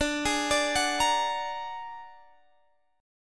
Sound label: Bb Augmented 7th D